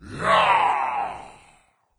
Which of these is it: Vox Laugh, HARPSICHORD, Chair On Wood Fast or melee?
melee